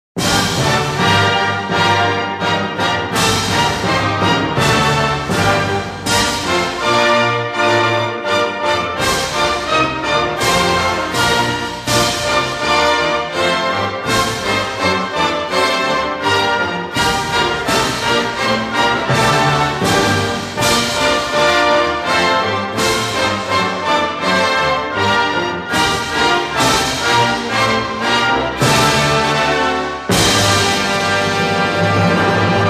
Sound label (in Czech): hymna